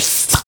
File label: smack, Cartoon impacts and smacks